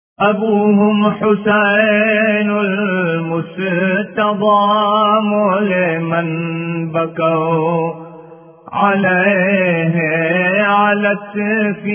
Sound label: Qasida - Arabi